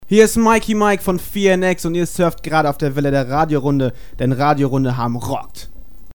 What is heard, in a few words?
Jingle; Station IDs